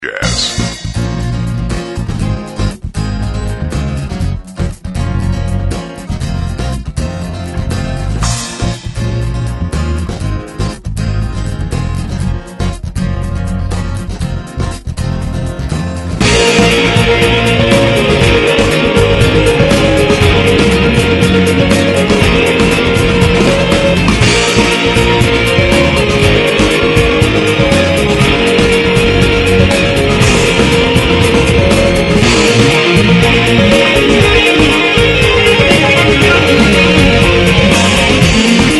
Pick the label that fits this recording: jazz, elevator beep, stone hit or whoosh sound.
jazz